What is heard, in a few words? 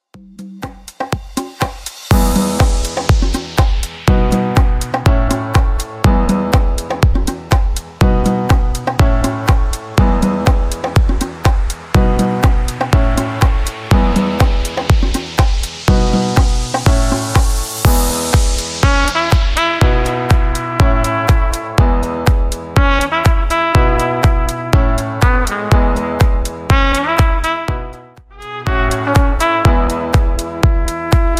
Top40 Simple Redrum 122bpm